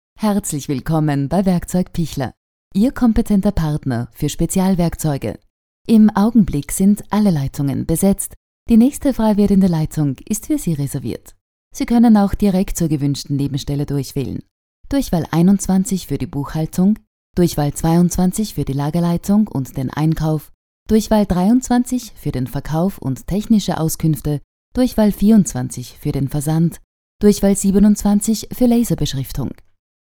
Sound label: audio jingle; pichler